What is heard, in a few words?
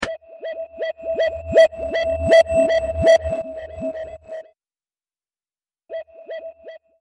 siren modulation